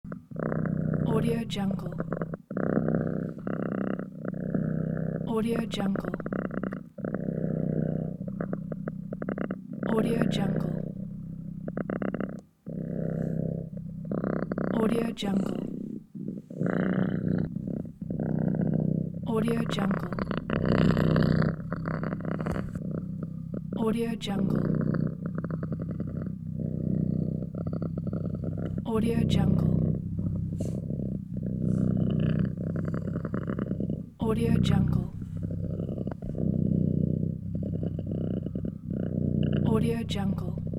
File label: purring cat